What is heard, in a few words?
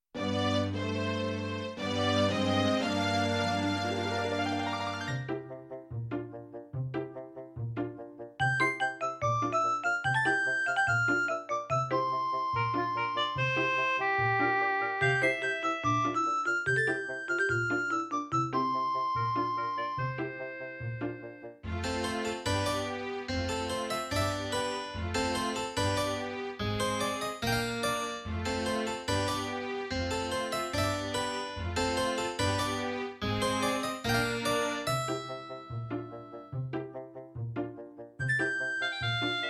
forest; PhoenixCraft-1.16.5; ambience music